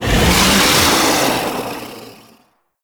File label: Flight Whoosh; Warframe; Teralyst; Ropalolyst